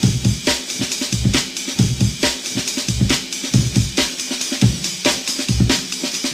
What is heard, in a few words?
amen break clean